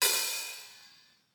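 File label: open hi-hat; eddyflux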